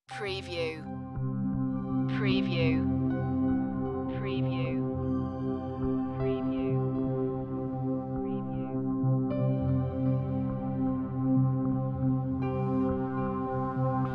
Trailer Production Elements - Texture, Tender, Reflective, Persistant